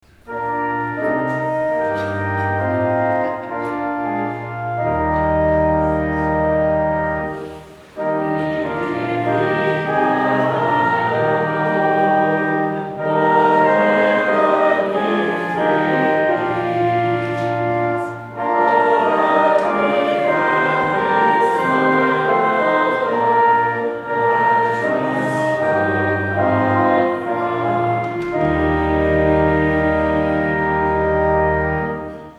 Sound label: Offertory Hymn